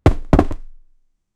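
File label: heavy object dropping on a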